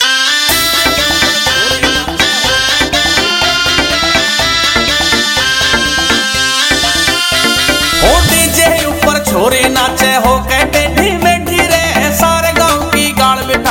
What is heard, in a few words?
New Haryanvi Songs Haryanavi